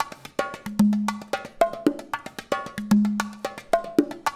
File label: LOOP 6 R, Percussion; InVision Interactive; GRUNT